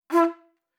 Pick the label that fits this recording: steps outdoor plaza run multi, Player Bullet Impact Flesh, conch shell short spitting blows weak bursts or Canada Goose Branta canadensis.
conch shell short spitting blows weak bursts